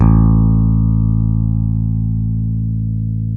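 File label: P BASS open A, pick bass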